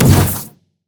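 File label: concussion grenade; LoL; heimerdinger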